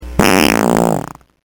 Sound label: big fart